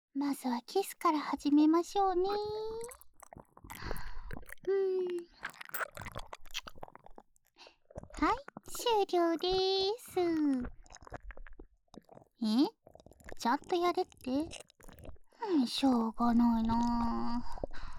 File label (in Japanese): 耳舐めされながらメスガキメイドにキス・手コキ・フェラされ; オナニー強要・手マンで潮吹きさせる音声; イッたら中出し分からせ孕ませっくす!イキりメスガキメイドのざぁこ♪ま◯こ危険日ご奉仕